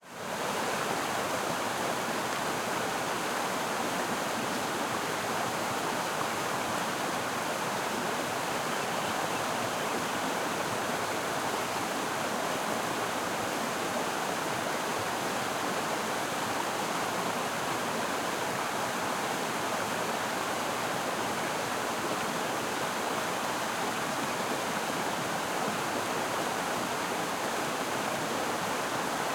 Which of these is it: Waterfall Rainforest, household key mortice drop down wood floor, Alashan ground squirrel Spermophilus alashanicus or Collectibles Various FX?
Waterfall Rainforest